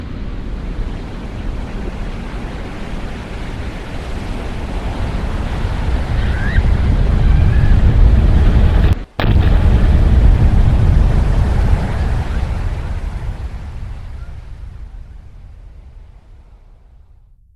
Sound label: tidal wave